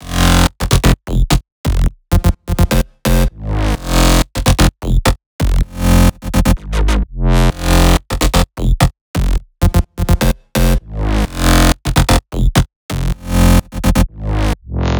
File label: Cutter Synths Root F, 128BPM; Vengeance Samples; Cutter Kit